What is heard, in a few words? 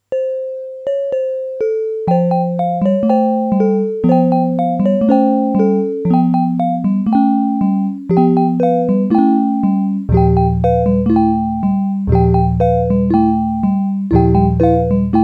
kitsch horror